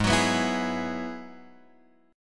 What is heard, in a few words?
Ab Minor 7th Sharp 5th Ab, Guitar Drop D; 6,9,9,9,9,x; down